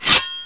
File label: SWORD; Pirates! Gold